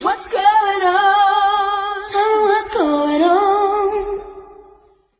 what's going on tell me what's going on SUNG

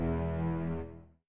creepy, String; Notes

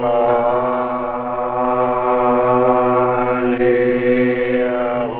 voice, Loops, Sad

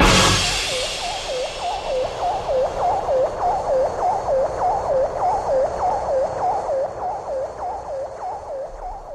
crash ufo

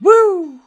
woo

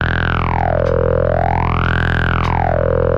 keys jigsaw funk note; Loops; Phonky

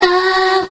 ah female sung; Voice; ooh ahh

